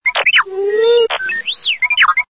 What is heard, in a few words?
Discharged; R2d2